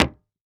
Foley Footstep Cowboy Boots Jump On Hollow Wood Surface Single; Foley Footsteps